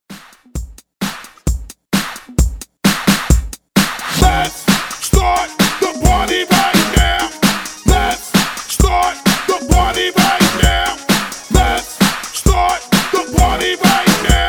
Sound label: Throwback Hype Redrum Clean